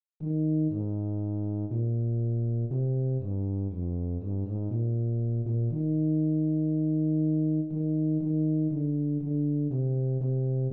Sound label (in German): bass